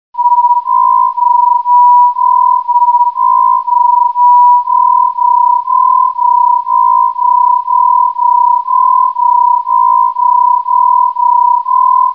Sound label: throb